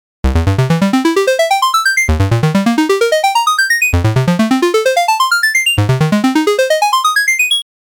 osc pulse 50

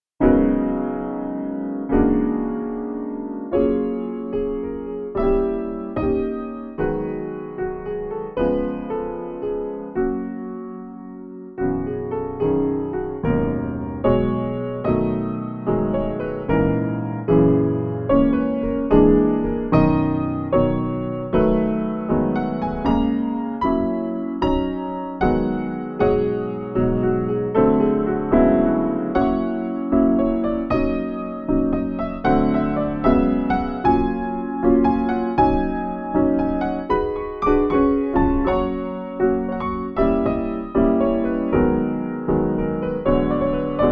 smooth jazz piano

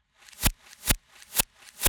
rapid gun fire reverse

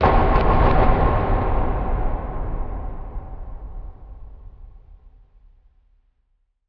big one shot

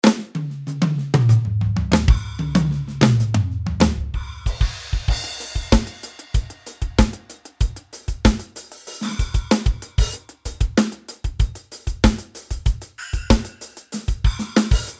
drums studio standard; handy drums

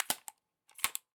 ar gun stock foley; nova

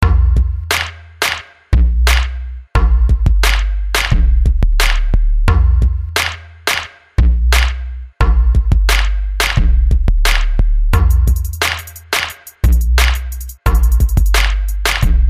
rnb kit

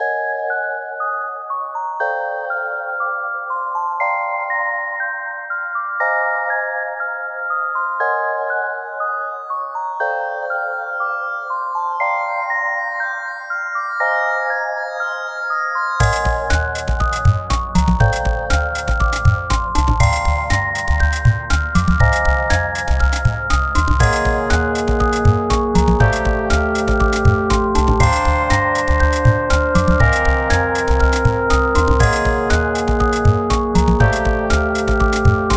playful; adlibxrom

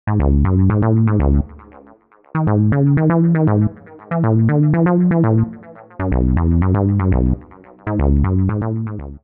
moog rhythm seq

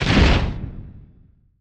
slam big perc

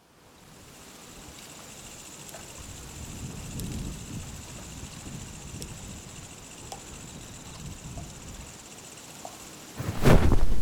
foley

